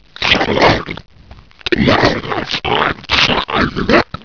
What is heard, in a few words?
zombie idle